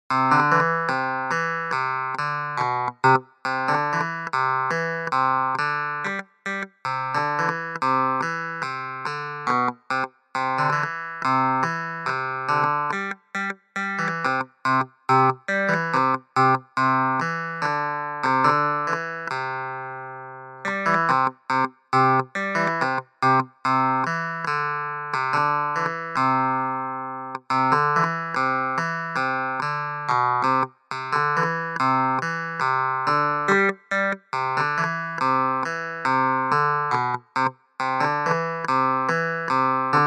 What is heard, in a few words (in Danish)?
Velkommen til koncerten spinette